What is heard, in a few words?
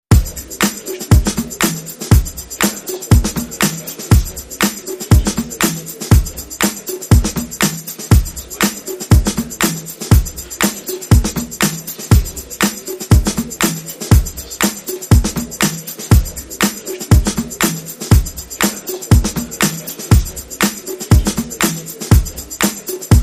Groovy Strings Beat